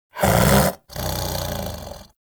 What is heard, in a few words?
Snow Critter Vocal Snore; Hunting Critters